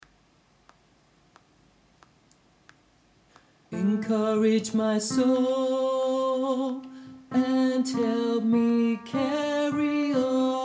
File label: tenor, gospel choir; encourage my soul